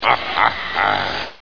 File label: grunt